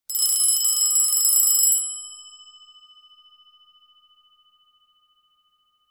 BELL DOOR

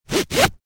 STRAP

